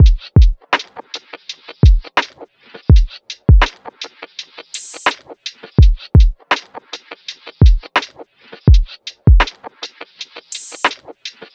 Crunch Drum Loop 83 BPM, Lofi; Cymatics - ANALOG EVOLUTION Beta

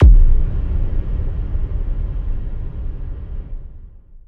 Blur Impact, Dubstep; Ghosthack - AC2024 - Day 13 - Dubstep Sounds 2025; Effects

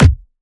Kick, dubstep; Demolition - Dubstep Samples; Drum Shots; Apocalyptic Kick

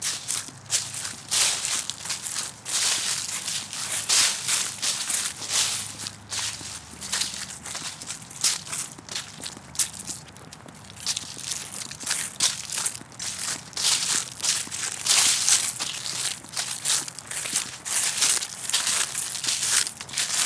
Walk Thru Dry Leaves

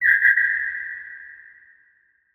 Jungle Hawk; FX; Cymatics; Light Free Sample Pack; Bonus Samples

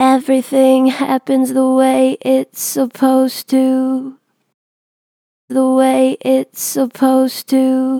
Natural Vibrato Gentle, Harmor Vocals

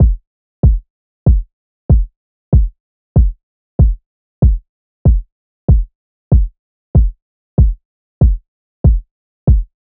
Drum Loop - 95 BPM Kick, afrobeats; Loop Stems; Cymatics; GHOST (Beta Pack); Cruise Drum Loop

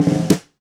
Steel Drag and Brush; Drums; Ghosthack - Free Snares 2023

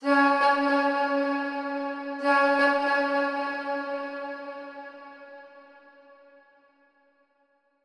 110bpm E, Vocalpack

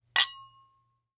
Glass Clink Toast; Fairlight Sound Lib

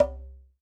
Djembe - C; percussion; Cymatics - Infinity Beta Pack 2.0; Zeus Percussion Collection

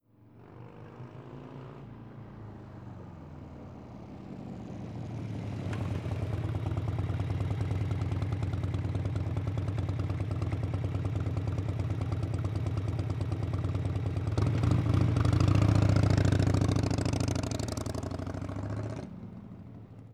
Motorcycle In Idle Away; Vehicles; Bikes & Motorcycles

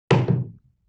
Wood Hit

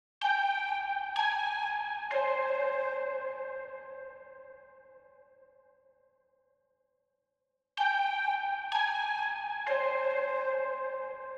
127 BPM F Min Lead; Trap Melody Loops; Loop Stems; Cymatics; Nightmares; Major

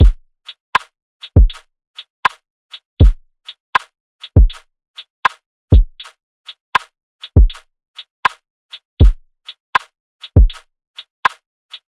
Drum Loop 80 BPM, Lofi; Autumn

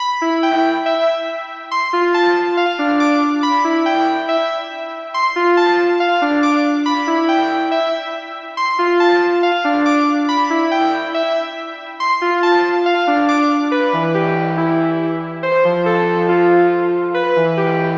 140 BPM E Min Prophet 5 Pluck, Synth; Loops; Loop Stems; Cymatics; Fantasy Synth Sample Pack; Heat

